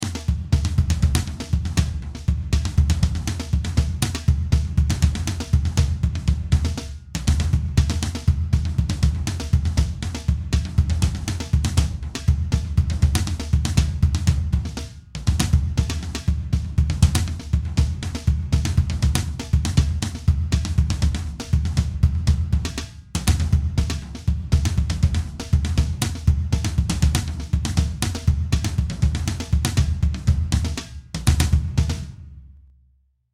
drum test

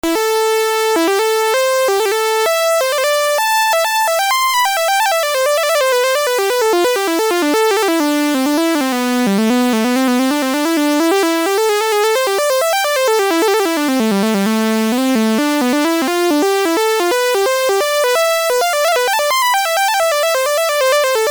anim step-saw; tellun

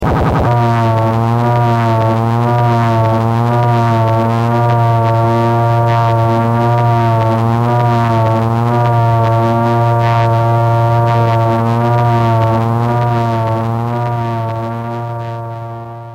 fake vibrato

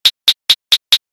closed hh, drums

